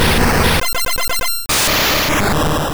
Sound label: Tri Attack; attack moves; pokemon; gen 2; gsc